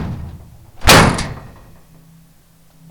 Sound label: Dungeon Door